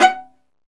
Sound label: STR VLA, Viola Solo